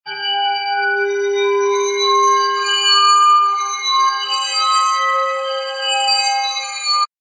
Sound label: fantasy bell ringers